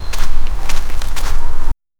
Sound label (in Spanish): goblin andando chaf chaf